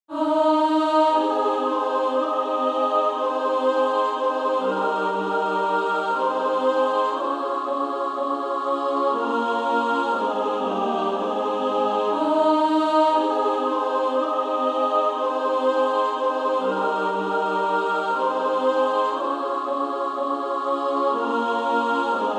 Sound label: Female Choir SSAA